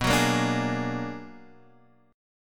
B-Minor Major 13th B down Guitar Half Step Down

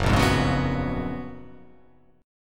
Gb Major 11th; GbM11 strummed; JGuitar

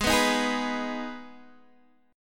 G sharp Minor G sharp down Guitar Open D, chord

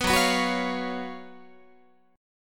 Gb Major 7th Suspended 4th Gb Guitar

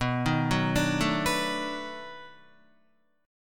B 7th Sharp 9th B, Guitar; arpeggiated

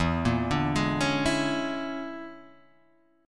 A sharp 7th Sharp 9th Flat 5th E

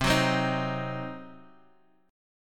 C Diminished, Guitar Half Step Down, chord

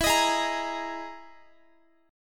E-Major Sharp 11th E, Guitar; EM#11 strummed

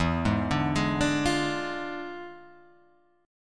E 7th Flat 9th Guitar Open G, chord

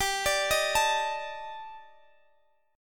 D sharp Major 7th Flat 5th, G, guitar chord

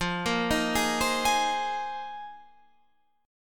C Minor 7th Sharp 5th, F, chord; arpeggiated; JGuitar

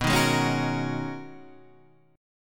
G sharp Minor 11th B, guitar chord

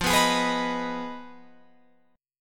F Minor 7th Flat 5th, Guitar, Open F; Fm7b5; strummed

